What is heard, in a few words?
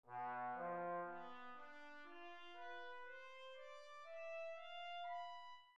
brass scale